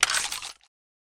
spin silencer, weapons; garrysmod

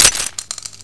skr mag out, skorpion; weapons; garrysmod

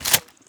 spas pump forward; weapons; garrysmod

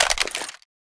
masada clip out; garrysmod

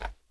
vollmer box; mg; garrysmod

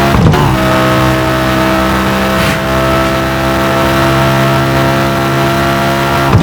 Caterham Fourth cruise loop, vehicles; garrysmod

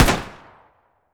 cf05 fire; weapons; garrysmod

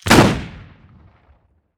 mortar fire; explosive m224; garrysmod

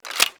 m14 mag out; garrysmod

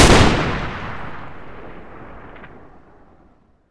m82 fire, sniper; weapons; garrysmod

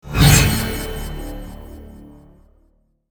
item health potion; cstrike; war3source

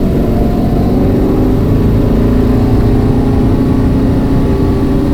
second shift accel up, car; vehicles; garrysmod; sincar

